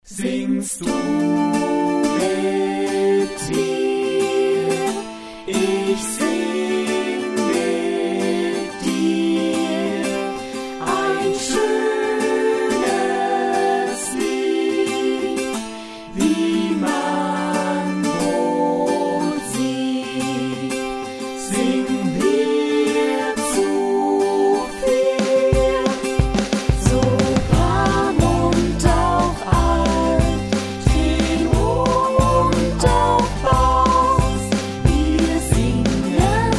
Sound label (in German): vocal etuede ohne bass, chor